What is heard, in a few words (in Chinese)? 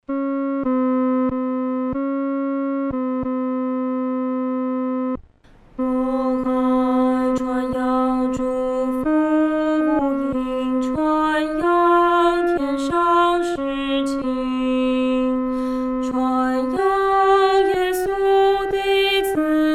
独唱（第二声）